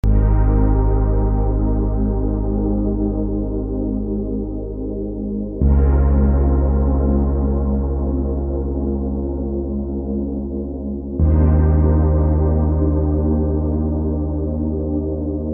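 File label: Music Ambient; Mindwave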